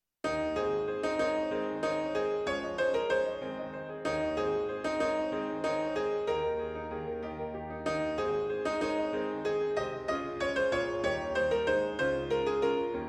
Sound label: Piano Soprano